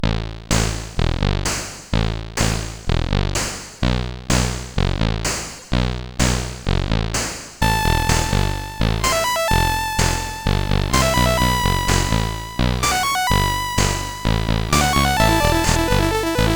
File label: Worse, CSG8580R5